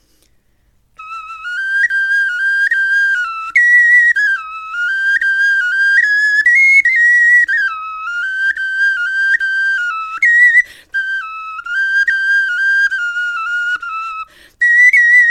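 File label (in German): melodie, Knochenflöten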